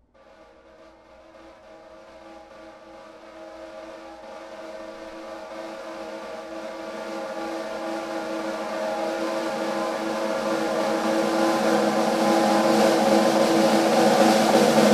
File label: Brass Snare